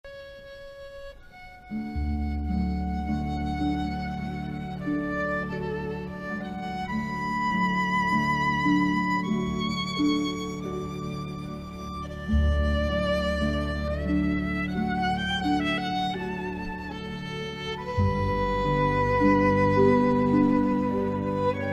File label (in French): Musique accueil